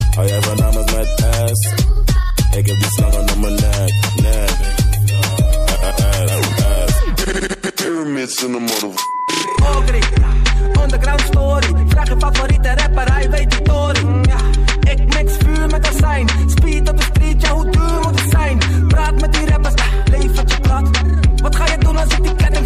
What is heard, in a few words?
best dutch rap ringtone